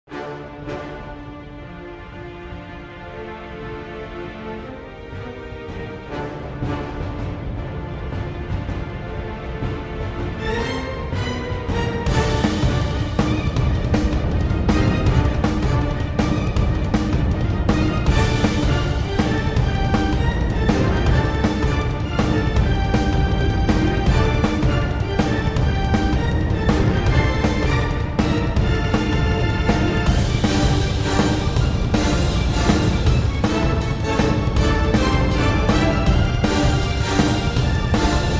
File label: Orchestral